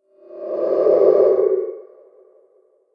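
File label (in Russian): звуковой эффект ужасов на хеллоуин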